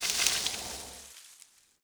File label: light bush impact